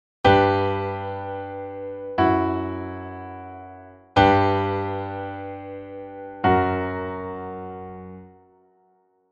prechorus verticalized

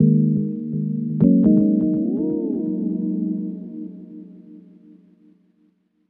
swerve gated expanded

